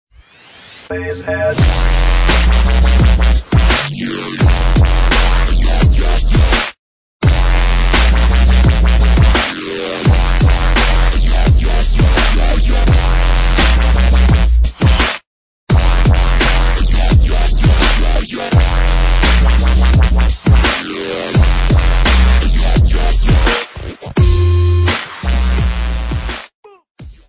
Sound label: bass